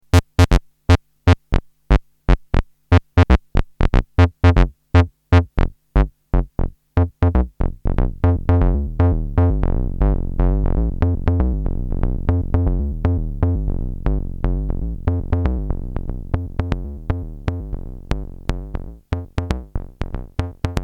EMS Logik

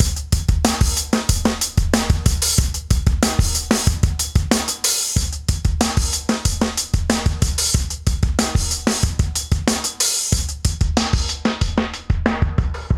Drumloop Filter; Nanozwerg

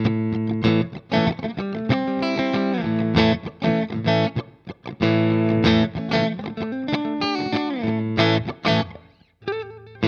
Ibanez RG Clean Mid Position

